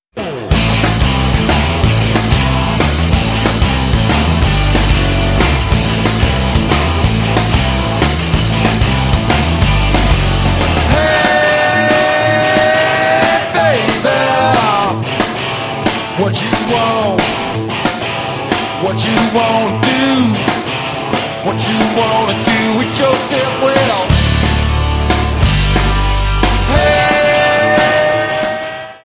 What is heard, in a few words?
raw blues